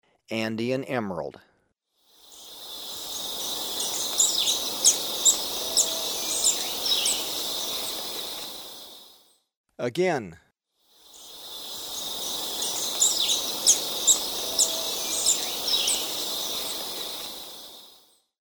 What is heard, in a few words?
Andean Emerald, Birds of Ecuador; S. America